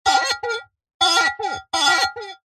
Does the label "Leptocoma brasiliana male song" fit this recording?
No